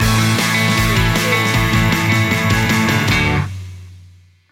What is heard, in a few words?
free flowing stinger